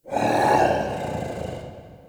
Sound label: Interior Light Burps, Infested, Ambience; Misc Indoor